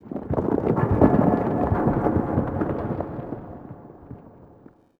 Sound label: city occasional